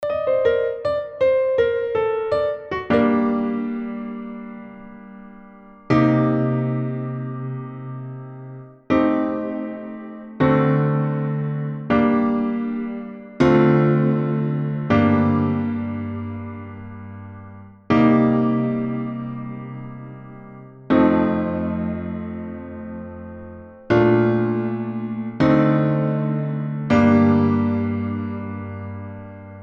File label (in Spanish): acordes